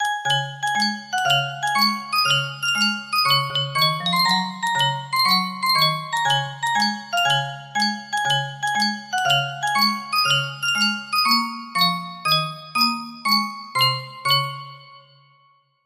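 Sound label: music box melody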